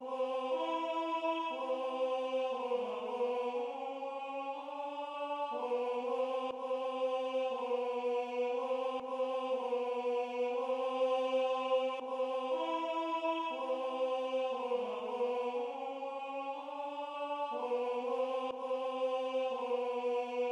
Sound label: tenor; fear no danger